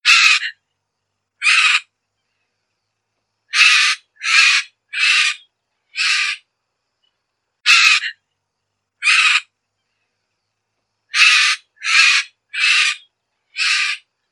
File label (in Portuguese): Canto do Maracana Guacu